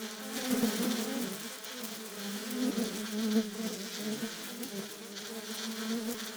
fly

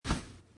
drag, inventory

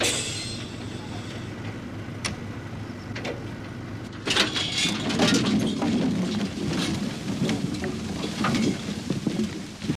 trees unload

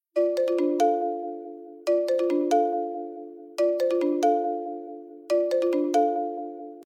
ring, phone

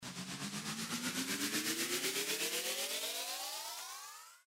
spin, gacha

